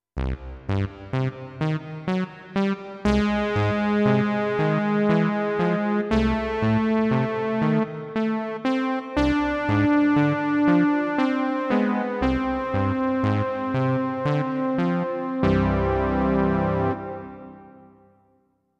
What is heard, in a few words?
Sawtooth Lead